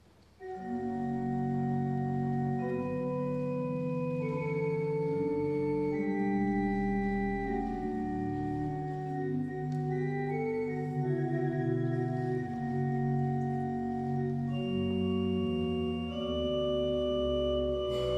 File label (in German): generalbass